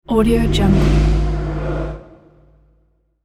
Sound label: haunted whoosh; sound-ideas